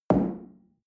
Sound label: shell place on tablecloth hard; soundpool